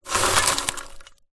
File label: popcorn handful take from metal bowl